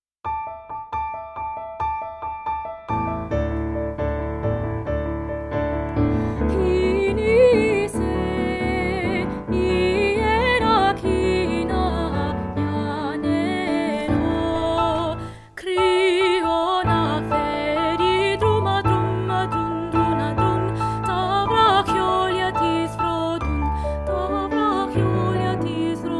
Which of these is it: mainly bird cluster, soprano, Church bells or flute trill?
soprano